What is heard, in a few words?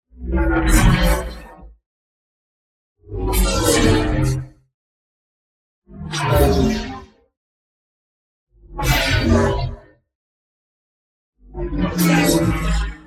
ABILITY CHARGE Arcane Force Activate; boom library; Matter